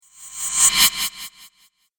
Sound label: Halloween Spell Wave, magic sound effect; Andrew Fleming